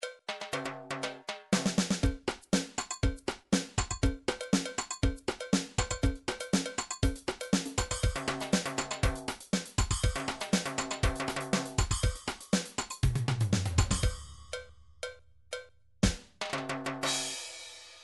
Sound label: midi drums drums mambo